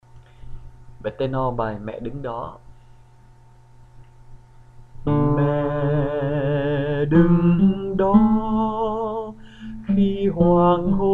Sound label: tenor